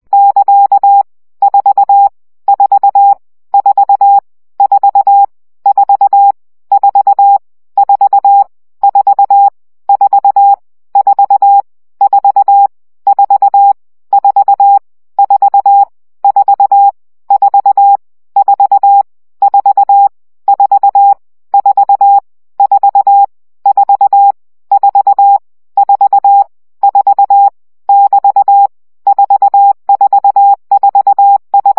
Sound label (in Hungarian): CW gyakorlas 1 17 baud